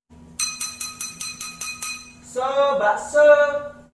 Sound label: ting ting; made with Voicemod